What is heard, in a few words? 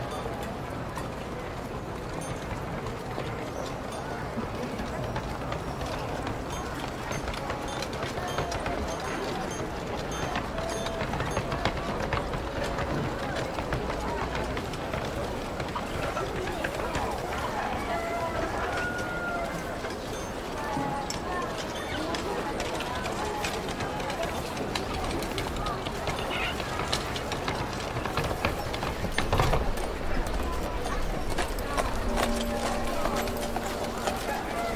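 old town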